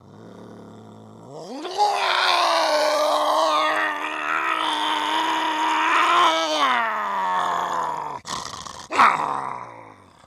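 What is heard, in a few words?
Monster Creature Growl, foley monster vocals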